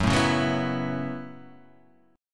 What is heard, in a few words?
F sharp Minor 11th, Guitar, Half Step Down